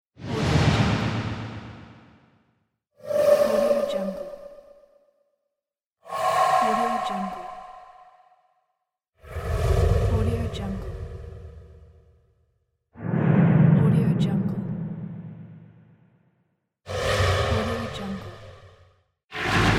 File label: scary whoosh pack; diogo-pereira